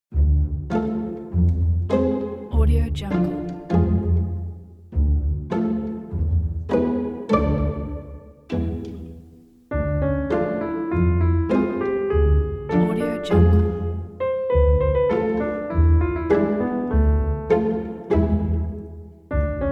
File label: comedy quirky